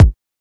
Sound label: wet kick drum one shot g sharp key